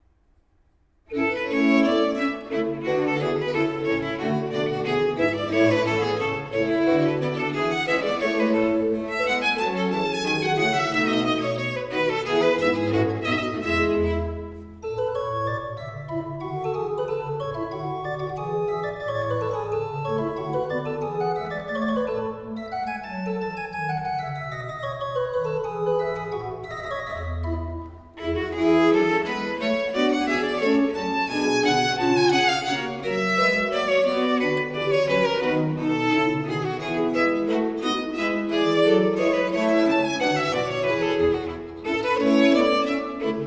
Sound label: cordes, D Major; Concertos pour cordes; Ensemble Pian & Forte; Six Concertos pour cordes, Op. 2, No. 1 in D Major III. Allegr